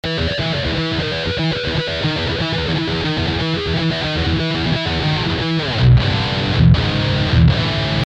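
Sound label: guitar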